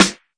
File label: classic hh Snr; STYLE KITS (Hip Hop, Etc); Classic Hip Hop Mega Kit